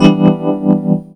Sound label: ORGAN; SoundFactory